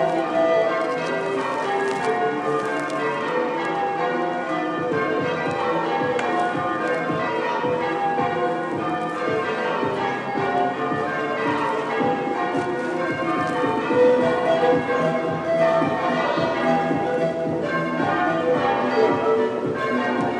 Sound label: church bells compete with panpipes